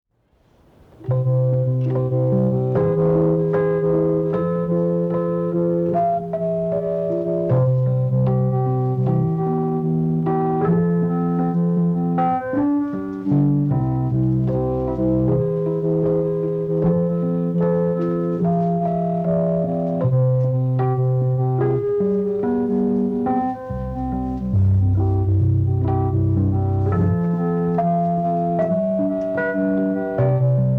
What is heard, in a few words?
instrumental